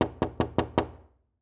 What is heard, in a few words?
Door Knock Door; MinorHallutinationsSounds; SFX Producer